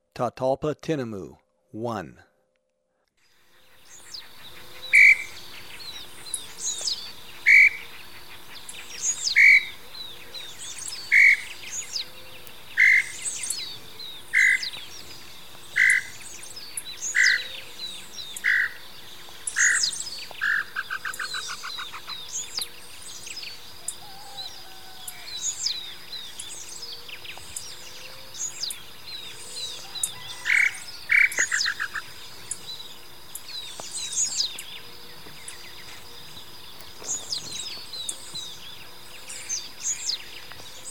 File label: Tataupa Tinamou; S. America